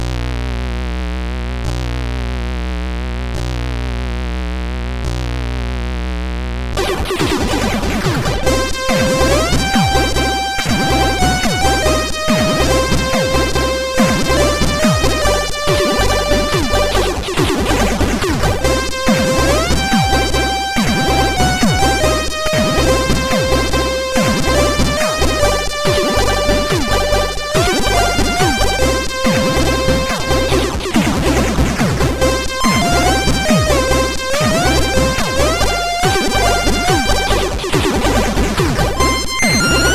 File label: Intromusic, sid MOS6581R4; Mad-Max